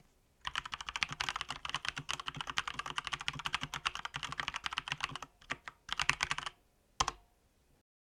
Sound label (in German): Tastatur Schreibgeraeusche